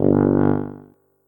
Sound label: tuba note